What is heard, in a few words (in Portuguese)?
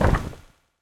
Pára-Quedas; Super Mombo Quest